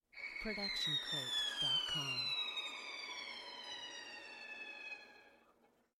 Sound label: Violin Seagull Effect